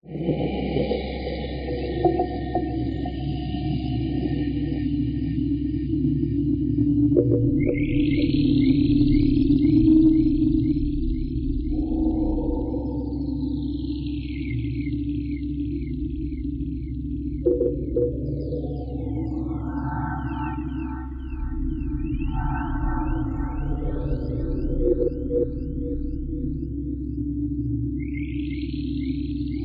horror growling rumbling dark eerie drone atmosphere; Audio Mangler